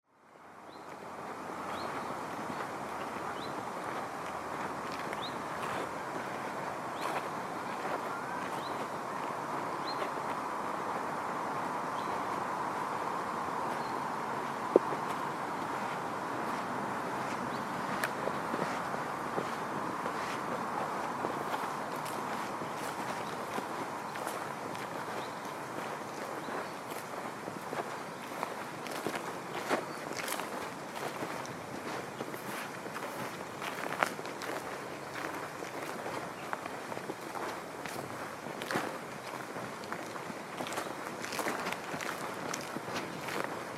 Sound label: Soft Sand and Grass